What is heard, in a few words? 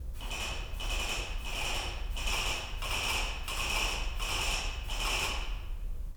horses